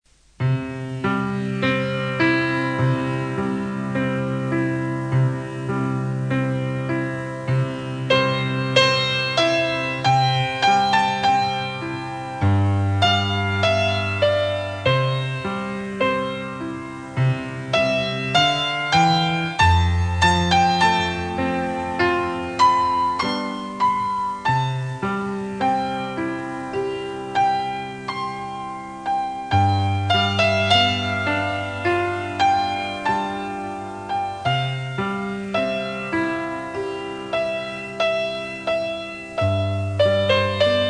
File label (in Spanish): (piano)